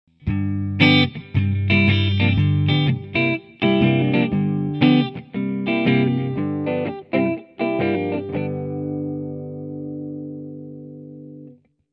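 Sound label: warp clean; digitech gnx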